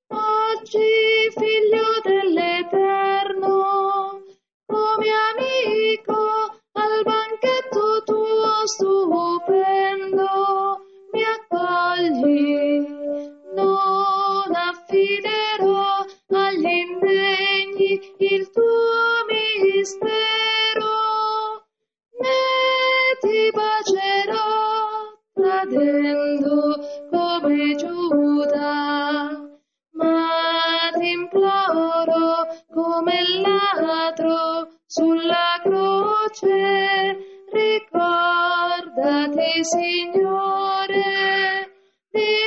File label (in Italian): oggi figlio dell eterno soprani